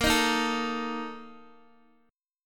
A sharp Major 7th Suspended 4th, down, Guitar, Open D